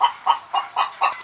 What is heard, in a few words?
chicken